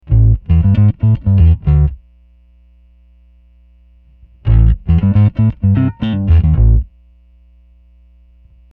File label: neck off; zoom b3